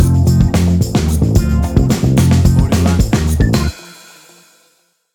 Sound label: Acid Jazz Fusion Stinger; Acid Jazz Fusion Alt